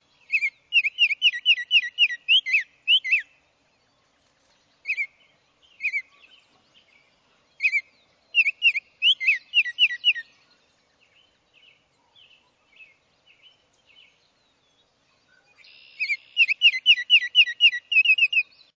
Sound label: Baltimore Oriole